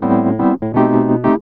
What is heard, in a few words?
E.PIANO, KEYS